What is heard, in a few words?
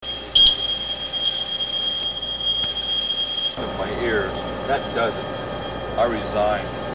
ping